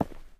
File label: ROCK, steps; Terrarum